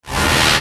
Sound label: Sweeper Electrical Swell; Blastwave; Production Element Imaging Element Accent Transition